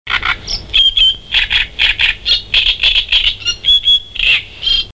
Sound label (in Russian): drozdovidnaya kamyshevka golos